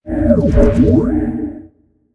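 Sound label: Force Field Start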